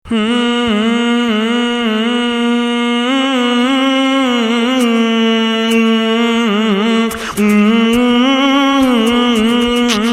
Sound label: beatbox; stabile seitenlage